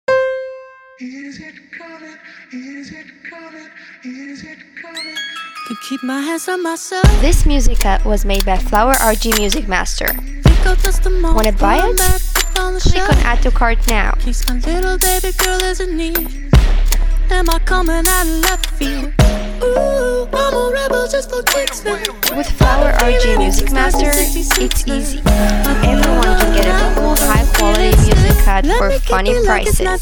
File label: music rhythmic gymnastics